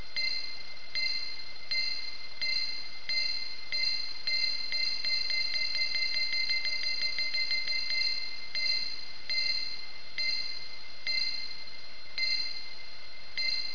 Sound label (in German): Echolot; 999wavs